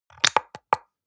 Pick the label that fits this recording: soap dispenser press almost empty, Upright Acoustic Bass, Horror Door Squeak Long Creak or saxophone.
soap dispenser press almost empty